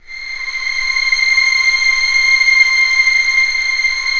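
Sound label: STR C6 PP, Orchestra Strings; Sound On Sound - Peter Siedleczek's Orchestra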